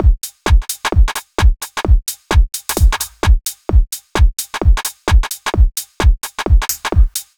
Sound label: Club Dance Beat